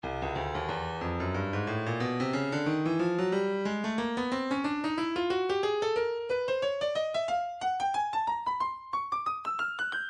stylus breakbeat midi 091 bpm tough break

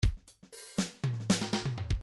midi fills funk

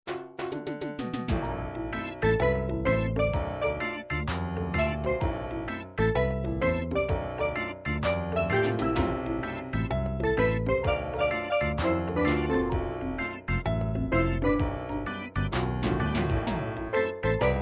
reggae

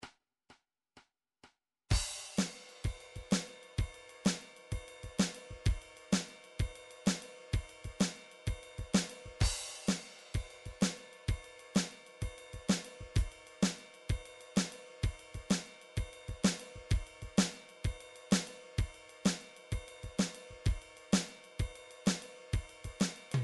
blues

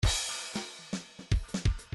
midi file grooves funk

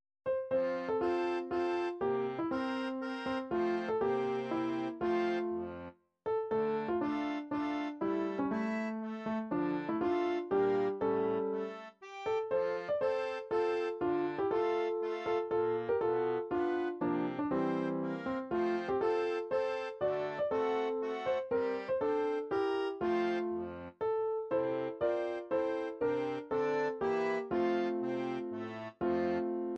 classical ballade, midi

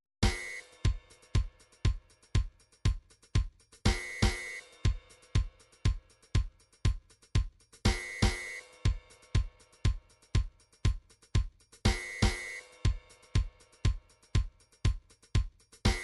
drums, midi drum patterns

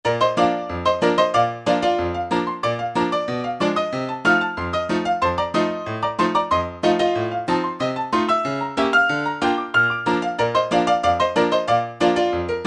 oldies ragtime ragtime

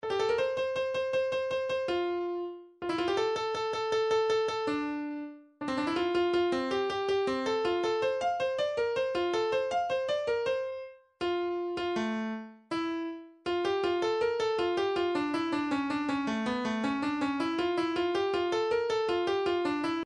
flute

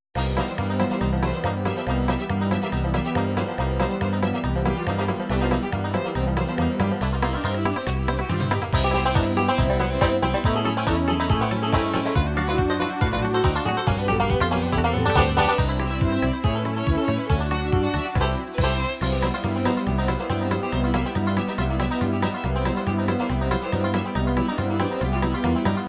country, midi

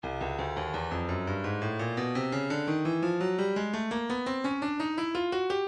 stylus breakbeat midi files 170 bpm offbeat

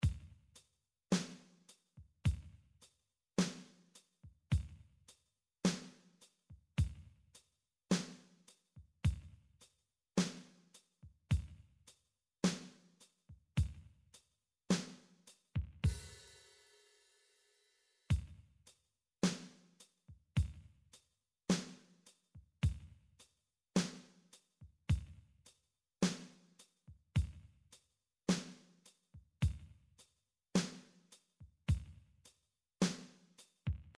drum midi pop; Drum Midi Files Collection

